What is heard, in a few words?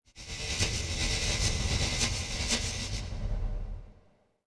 Goodyhut Electric; Ambient; Sid Meier's Civilization 4 Complete; Beyond the Sword